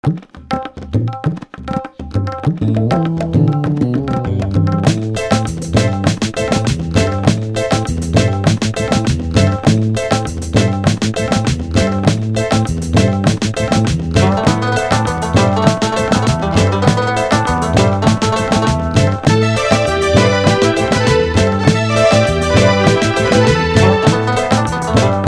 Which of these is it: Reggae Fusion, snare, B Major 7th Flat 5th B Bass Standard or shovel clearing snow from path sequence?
Reggae Fusion